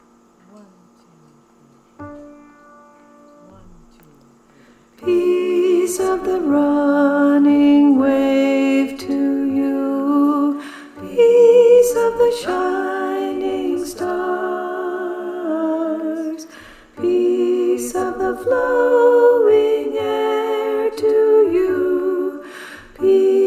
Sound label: soprano; The Freedom Choir